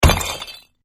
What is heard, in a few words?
block break; holidays; snowbrawl